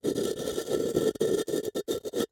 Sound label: Jar, terracotta, lid, rubbing, scraping, grating, rough, ragged, short; Eiravaein Works; Vaeyan IV